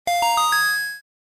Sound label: Fanfare; ninja smasher